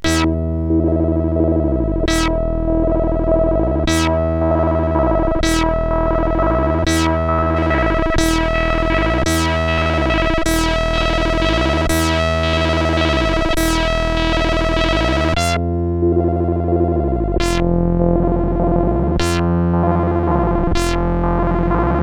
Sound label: Effekter, sid CSG8580R5; Fredrik